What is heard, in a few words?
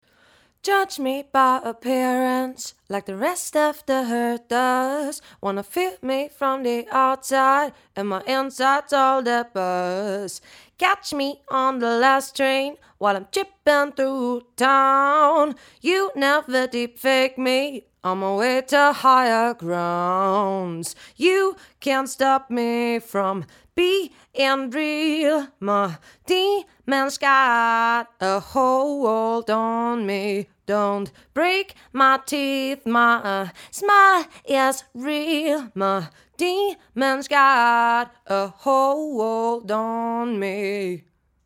fem voc saturated tube